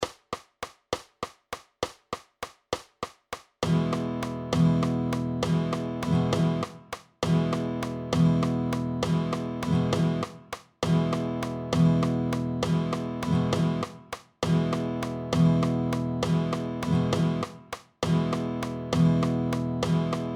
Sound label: 12 8 Strum Pattern